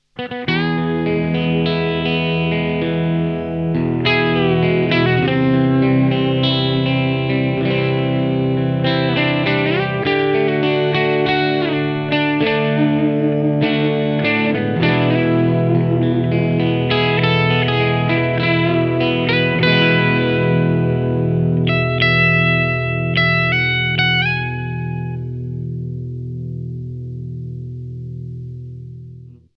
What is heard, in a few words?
Maxon TBO9